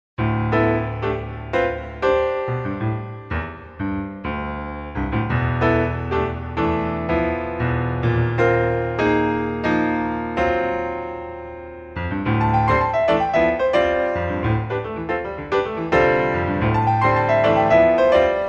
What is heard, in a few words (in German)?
Modal Tunes Klavier